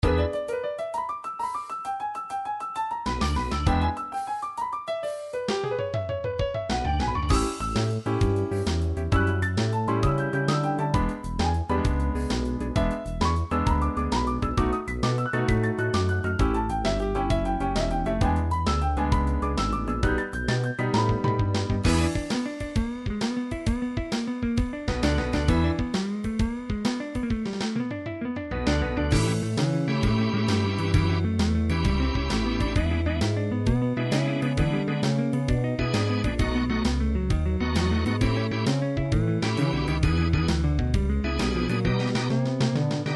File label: blues